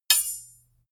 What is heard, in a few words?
sword hit sweetener